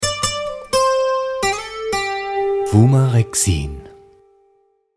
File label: INTRO Jingle